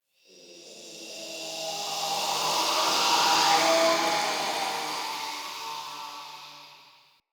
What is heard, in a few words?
Amb Distant Wraith Whisper; Ambience; Mendicant Area